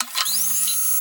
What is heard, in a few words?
rez; swampboat